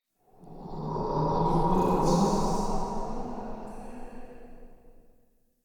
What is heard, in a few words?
Amb Corrupted Wind Gust; Ambience; Mendicant Area